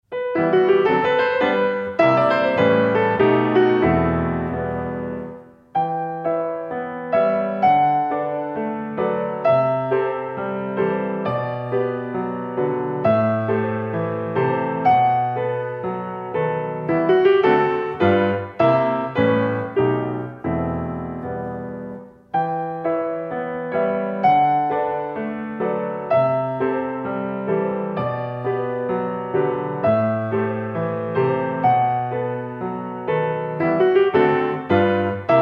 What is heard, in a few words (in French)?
chanson d automne piano